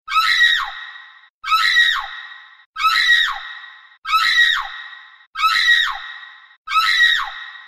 girl shouting; ringtones

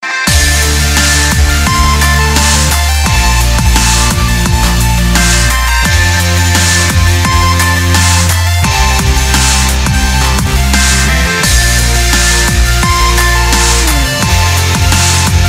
Dubstep Melody

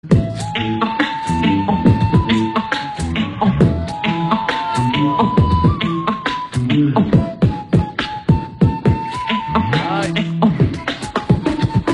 Remix Flute

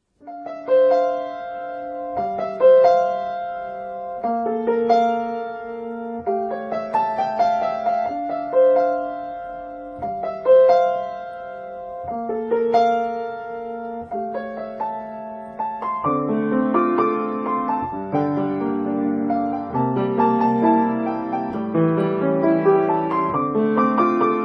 piano cover

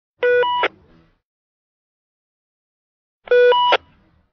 Tubelight Radio tone

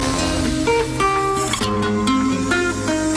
Guitar new sms tone

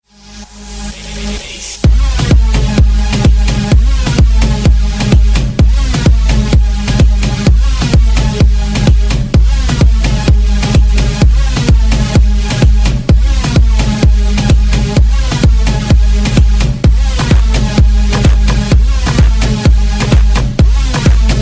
ultimate beats; hip hop

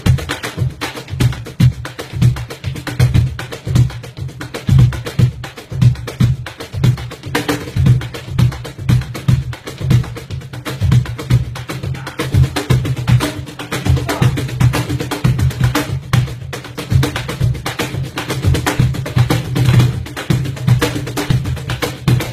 Cajon

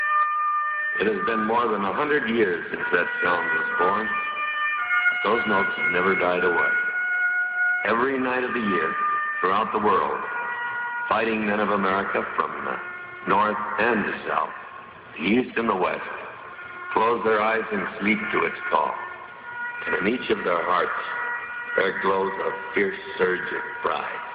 military taps; ringtones

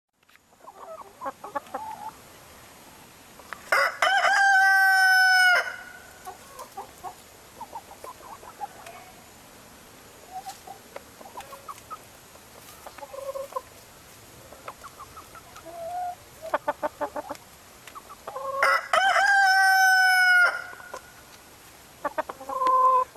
hens and rooster; animals

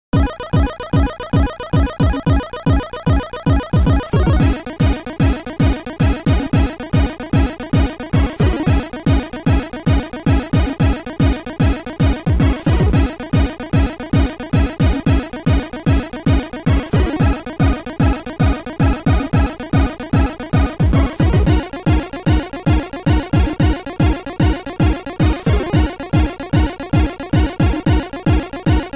NES Acid

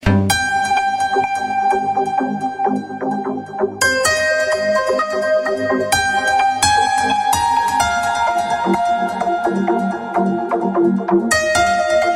Sunset Beat

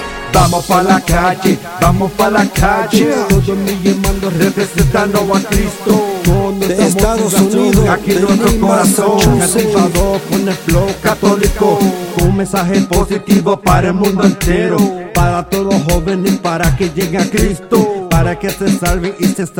RAP

